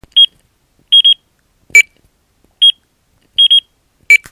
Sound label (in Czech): pipani